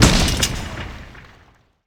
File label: Stun; Puzzle Chronicles; PS3; PSP; X360; SFX SPELLS